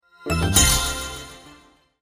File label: winline big; Purple Hot 2